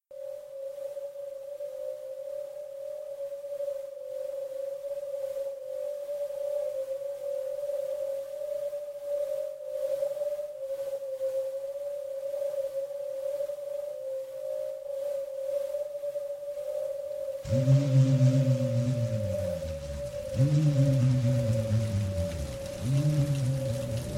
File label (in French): Pluie tropicale; Heritage